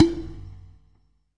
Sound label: Medium pot BM, Clay Pots, ethnic and world percussion